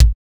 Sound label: airy kick drum one shot a key